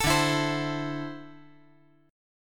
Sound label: D 7th Suspended 2nd down, chord